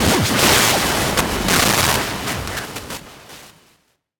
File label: Static; Blastwave